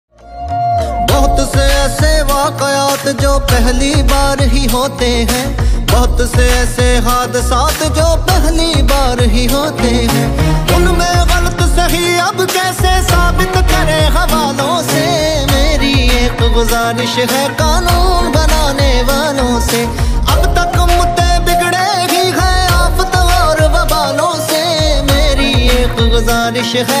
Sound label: DJ Punjabi Ringtone